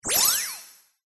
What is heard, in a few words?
science fiction computer activate tone